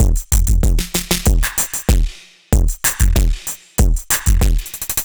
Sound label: Machine Drums, 95bpm, analogue circuit; musicradar